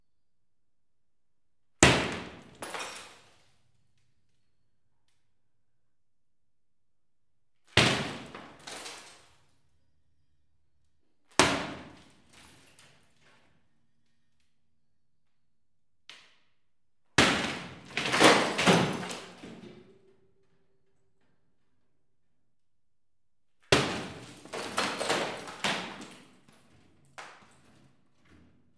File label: Earth Demolition Hammer